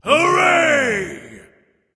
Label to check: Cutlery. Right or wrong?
Wrong